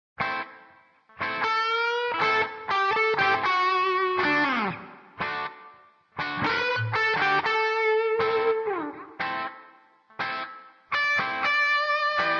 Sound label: Red Electric Guitar - Black Electric Guitar - Pink Electric Guitar - Blue Electric G